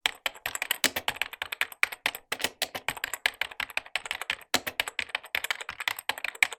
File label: office keyboard apple typing; sound effects one